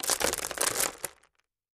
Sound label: Landscape Rock Plast, rocks & dirt